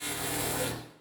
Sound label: Electric Sizzle